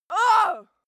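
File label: female pain, screams; schreie; menschen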